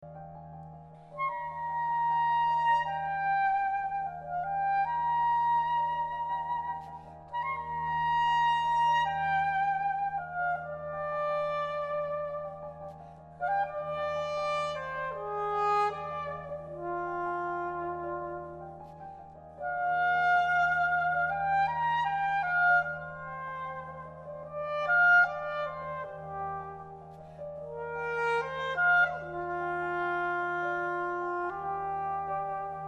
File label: Sopranino Sax; Soaring